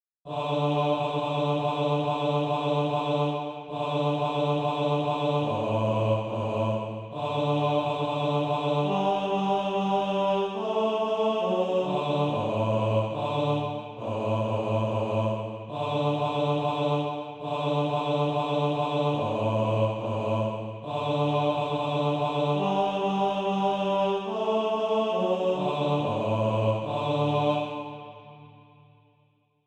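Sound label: bass, SATB Practise Music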